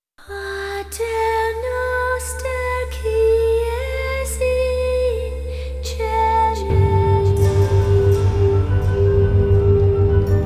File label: Gregorian; Montage; microtonality